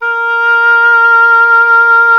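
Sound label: WIND OBOE; Miroslav Vitous; Solo Instruments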